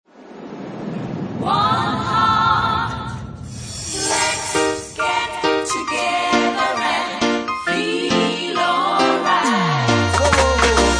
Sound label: Bassline House Mix